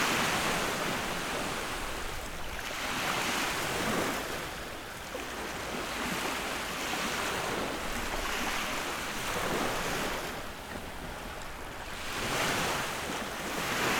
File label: beach; Golden Crayon Sounds